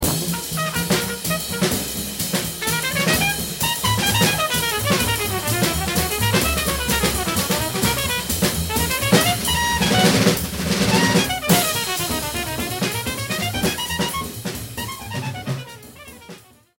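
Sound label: tpt solo, trumpet solo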